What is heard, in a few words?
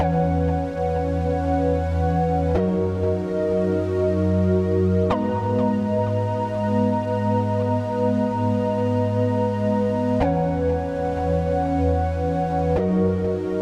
94 BPM G# Min Chords; Melodies; Loop Stems; Cymatics; GHOST (Beta Pack); Freedom